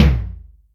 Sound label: Yamaha DTXpress Electric Tom Hybrid F